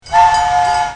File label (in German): Lokpfiff, modellbahn